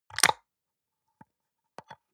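soap dispenser press squish slow release, bathroom; haus-garten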